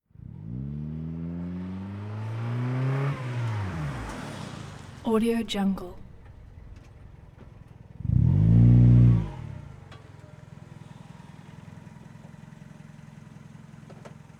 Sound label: street race car fast approach stop reverse and off; hollywoodedge